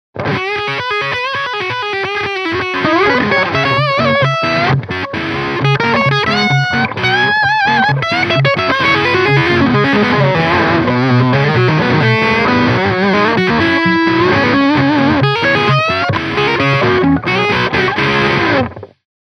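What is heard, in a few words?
fuzz only no octave, pedals; octo59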